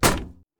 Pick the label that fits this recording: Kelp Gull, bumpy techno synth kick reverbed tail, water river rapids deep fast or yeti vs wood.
yeti vs wood